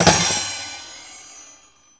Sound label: drop, percussive